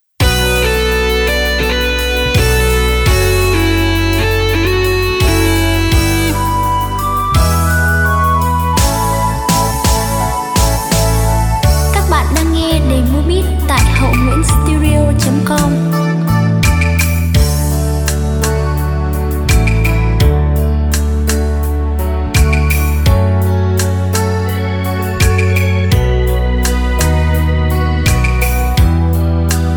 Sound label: beat, playback